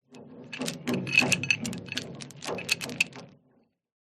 industrial chain shake rattle wobble against hollow metal